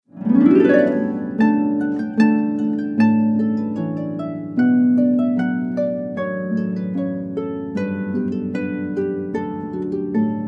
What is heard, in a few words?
Canon Fanfare